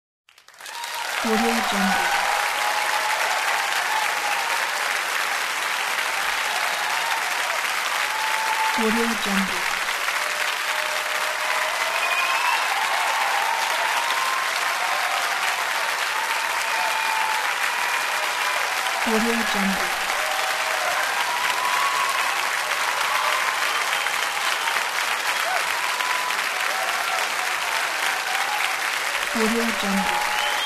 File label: applause cheers